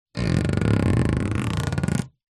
foley plastic sheath metal coil stretched rub squeak; zapsplat